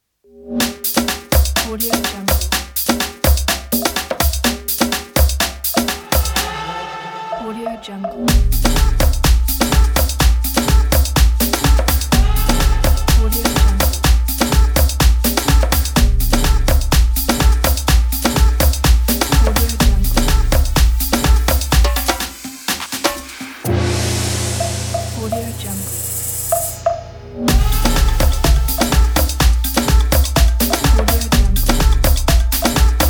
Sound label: percussion african dance